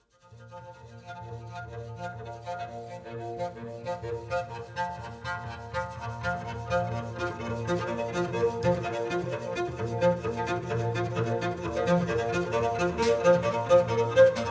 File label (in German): arco harfe, bass solos